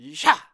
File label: attack, monster